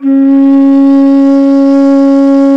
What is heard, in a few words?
BASS BANSURI; E-MU Producer Series Vol. 7 – Old World Instruments